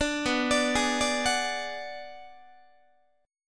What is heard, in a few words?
B Minor 6th B, Guitar; Bm6 arpeggiated; JGuitar